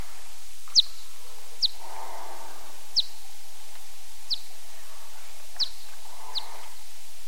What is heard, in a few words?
M. daubentonii, bats